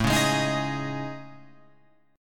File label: A Major Sharp 11th, down, Guitar, Open F, AM#11 chord; strummed; JGuitar